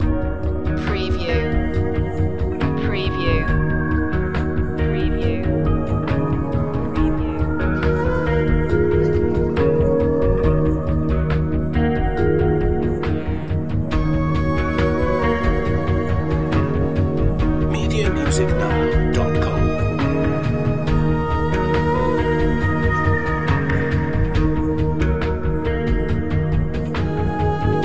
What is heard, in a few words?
urban grand loop